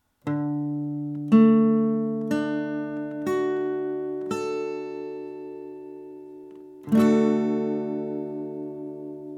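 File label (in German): D Dur Barre A, gitarre; Der D-Dur-Akkord wird aus dem 1. (Grundton), 3. und 5. Ton der D-Dur-Tonleiter aufgebaut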